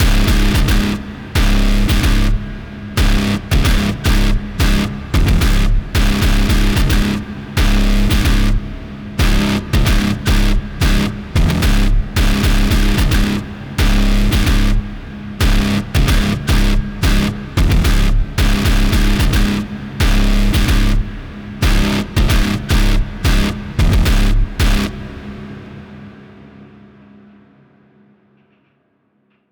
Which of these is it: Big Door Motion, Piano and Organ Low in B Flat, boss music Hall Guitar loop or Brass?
boss music Hall Guitar loop